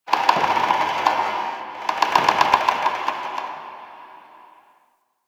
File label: Wood Creak; Ambience; Warframe; The New War; Quests; Zariman